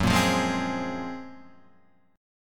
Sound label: F sharp Major Sharp 11th, Guitar, Standard; 2,1,3,1,1,4; strummed